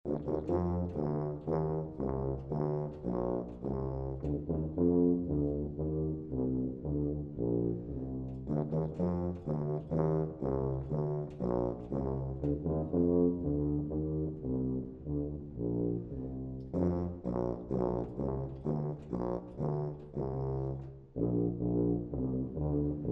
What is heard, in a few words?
dueling tubas